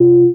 back button; XDK4627 BIOS and XDK Launcher and Dashboard(for Retail); ogxbox

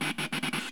comm static; Ambient Audio; XDK4627 BIOS and XDK Launcher and Dashboard(for Retail); ogxbox

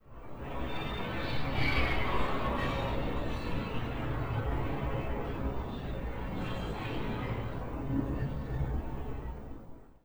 ambient Steam; Ambient Audio; XDK4627 BIOS and XDK Launcher and Dashboard(for Retail); ogxbox

